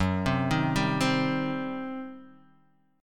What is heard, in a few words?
G Major 7th Suspended 4th Sharp 5th, F sharp